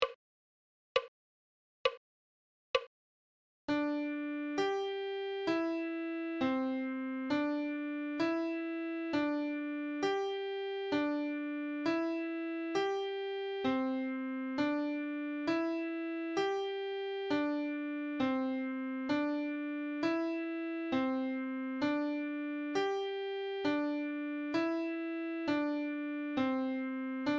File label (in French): Clé de Sol, Adagio